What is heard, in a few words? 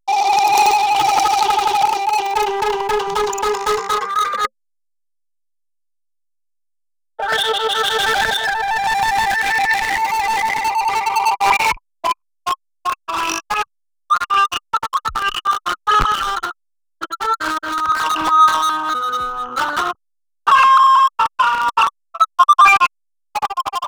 Interferometer Flute; KIBO; KIBO Interferometer stems; Ixox Flute